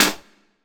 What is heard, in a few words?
SD HIP; Best Service; Dance Mega Drums